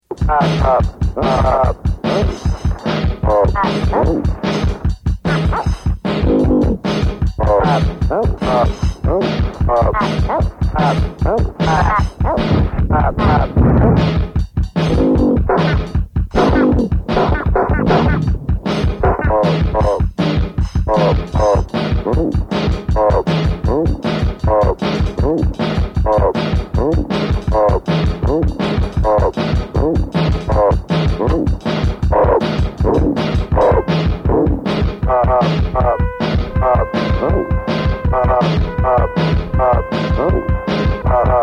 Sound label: Ahhh